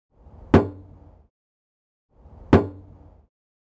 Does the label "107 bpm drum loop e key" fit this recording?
No